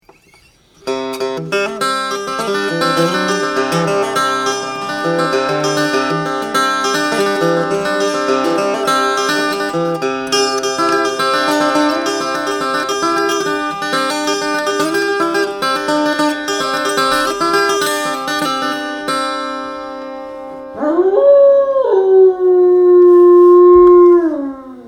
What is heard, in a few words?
banjo lute